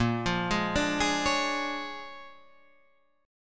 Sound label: A sharp 7th Sharp 9th A sharp down Guitar Half Step Down; A#7#9